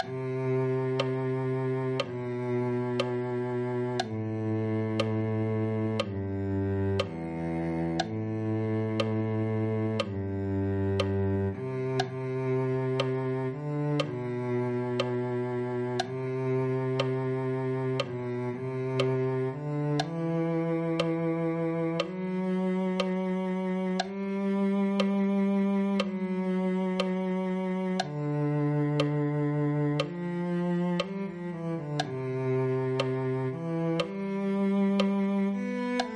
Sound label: cello